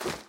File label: flap